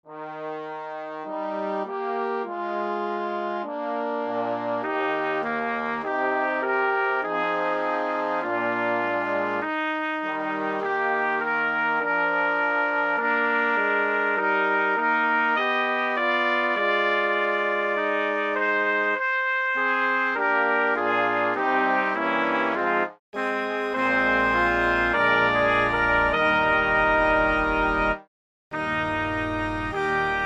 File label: Wedding Chorus Midi; wedding suite; O Perfect Love